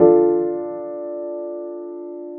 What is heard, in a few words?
chord Dm